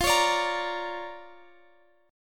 D Minor Major 7th Sharp 5th, F, guitar

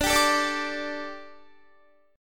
D Suspended 4th D, down, Guitar; strummed; 4E,4A,5D,5G,5C,6F

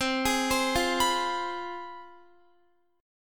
C Major 7th Suspended 4th Sharp 5th C down Guitar; CM7sus4#5 arpeggiated

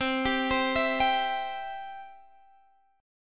C Major; arpeggiated; x,15,17,17,17,15

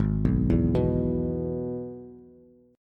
Ab Minor Sharp 5th, Bass; Abm#5 arpeggiated; JGuitar

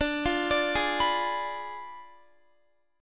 D Diminished 7th D down Guitar; Ddim7 arpeggiated

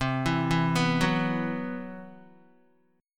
C Major 7th Flat 5th down Guitar; CM7b5 arpeggiated; JGuitar

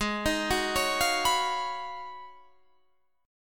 Ab Minor 13th, Ab, Guitar, Standard; Abm13 arpeggiated; JGuitar

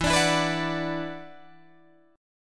E 7th Suspended 2nd Guitar DADGAD; E7sus2; strummed

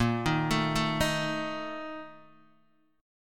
A sharp Major 7th Suspended 4th, Guitar, Open D

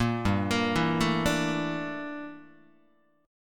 G Minor 6th Add 9th G down Guitar; Gm6add9 arpeggiated; JGuitar

